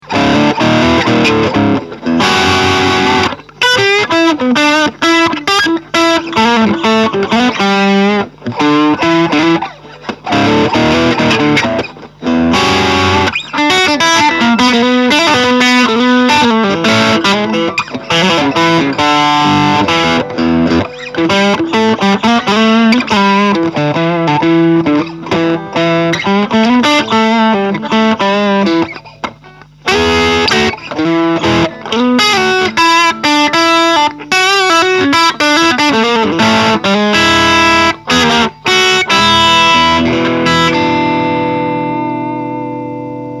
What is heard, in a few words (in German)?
Gretsch panther zerre gut